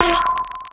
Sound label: Glitched Scream; SFX